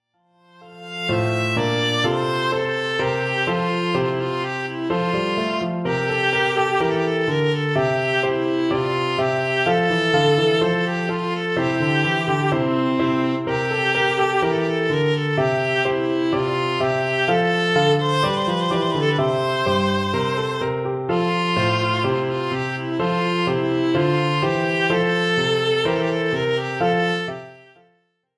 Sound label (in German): festmarsch